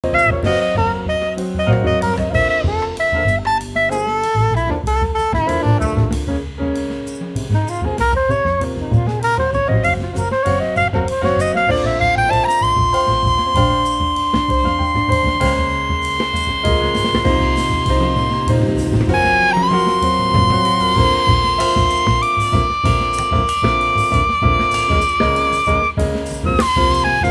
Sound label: the drum triumph